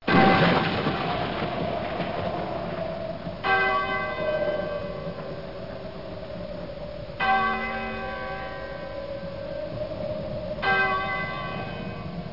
gongs; sound sensations

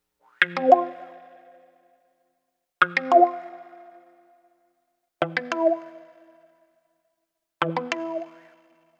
guitar, Pop; KILLERSOUND; Remixable SoundSets

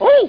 OOOO!

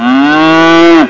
cow

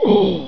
bump

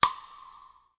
tac; efectos

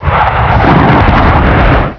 Medium Bombing of Troops; Onslaught

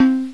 Kalimba (C3); X-Wing; X-Wing Patches

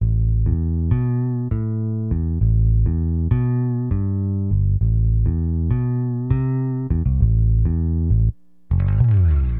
Bass, Acid Jazz Funk

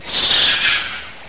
Putties Disappear

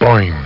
bong

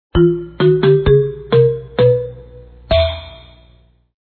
bgm; POKEMON; fool

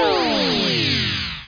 laser; robotron

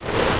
spike

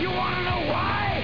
Jump; Liar Jump; Macworld (1997-11); Games World; Shareware Games; Arcade; Peng! 1.0

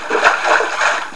WATER

